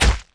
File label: hit sword